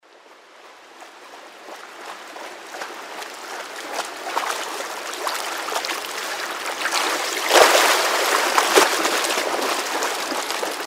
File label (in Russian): podplyvaet nogi nyriaet